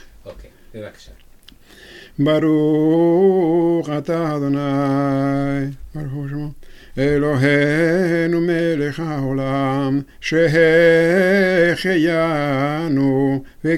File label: Sheheheyanu; Kippur Arbit